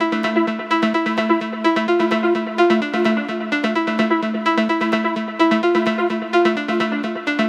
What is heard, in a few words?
Melodic Loop Amin 128, Melodic Techno; Paradox; Ghosthack; AC2024 - Day 12 - Melodic Techno